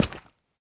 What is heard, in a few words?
coral, step; minecraft